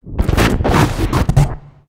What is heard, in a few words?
Glitch; speaker woman pack